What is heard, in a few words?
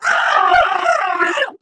ballbot pain lg; player; Portal 2